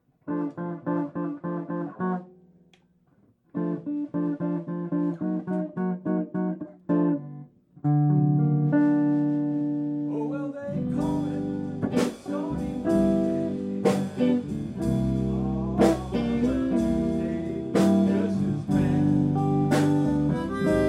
blues

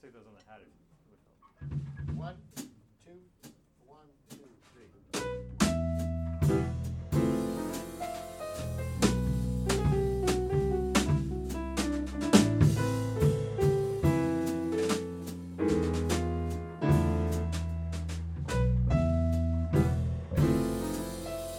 Drums, jazz

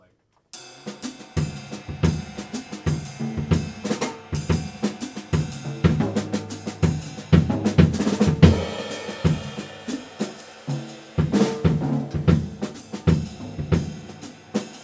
jazz Blues Phrasing

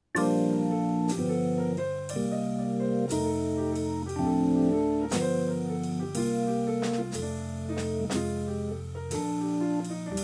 jazz Halftime

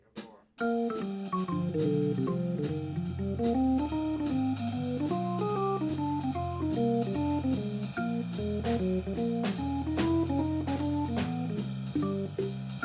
jazz Swinging

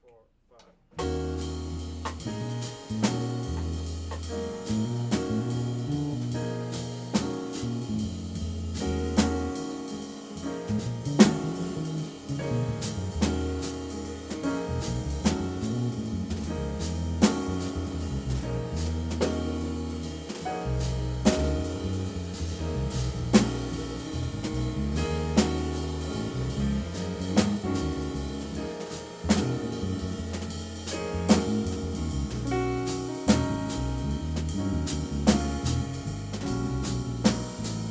jazz Bass Solo

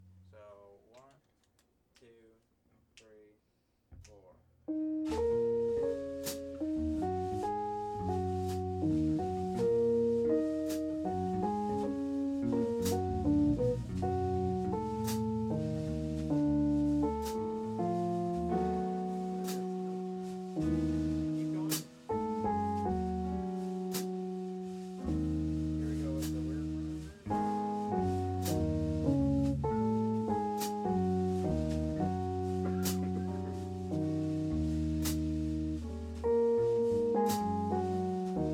jazz

